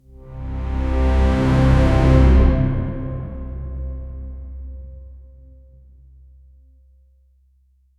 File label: a cinematic and bass heav, fanfare